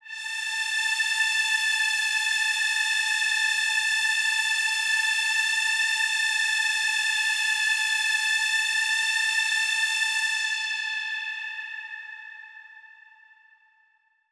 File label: Big Pad A4; KG MegaSaur